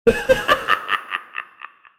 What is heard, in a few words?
distant demonic laugh